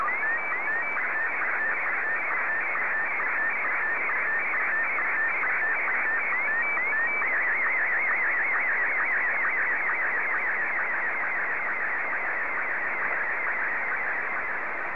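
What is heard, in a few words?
SSTV, Martin 1; ZL2TJM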